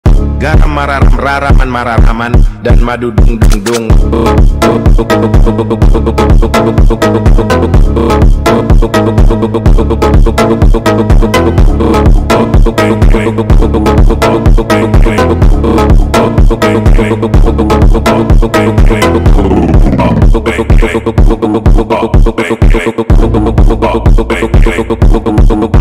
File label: funk